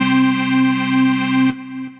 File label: Orchid Organ; Piano & Keys